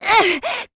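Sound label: Damage, Japanese; voices; heroes; Hilda; kingsraid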